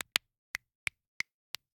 Crepitus, fingers, flex, pop, snap, crack, singles, variety; Eiravaein Works